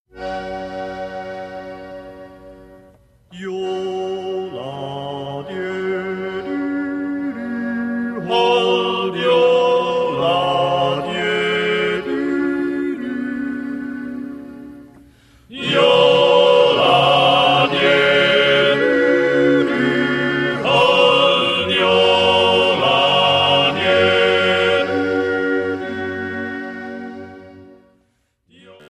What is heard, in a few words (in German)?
langweiler jodler